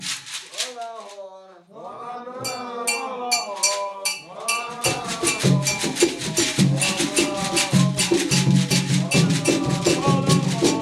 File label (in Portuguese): tambor de mina; thoya jarina